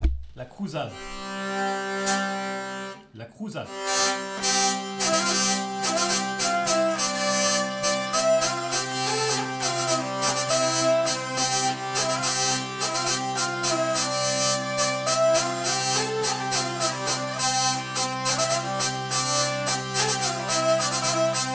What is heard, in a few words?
Bourree, Auvergne; La Crouzade